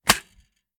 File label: raise first mag in, reloads; gmod